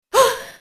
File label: attack; snes; smas; neptune